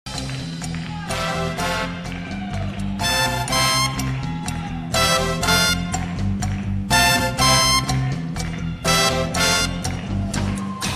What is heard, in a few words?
Cha-cha-cha